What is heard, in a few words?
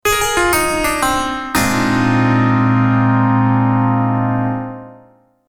scene change